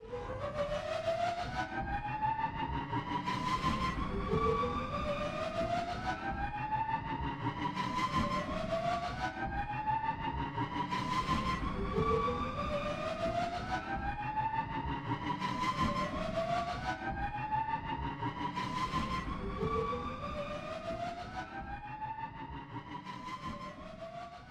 Player Hand Slide Energy Rising Loop

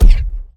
Ball Player Hand Hit Extra Hard

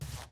Player Paddle Release Layer Snow Light

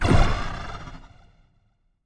Flag Grabbed; CTF

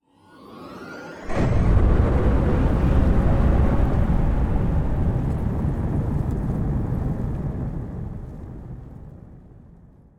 Ship Thrusters; Entry Seq; Skybox

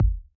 Ball Collision Geo Tackleball Extra Light

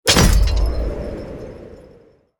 Grenade Explosion; Krampus Trap

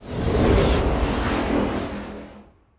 Skybox Scan Beam Entry

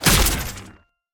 Hit Other Player; Ion Fang; Wieldables

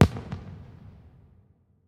Fireworks

